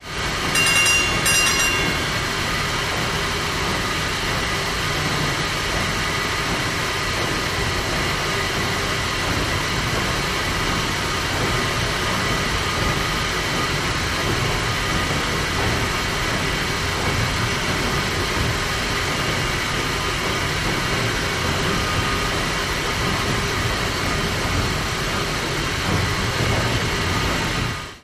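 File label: steam engine telegraph, WWII Liberty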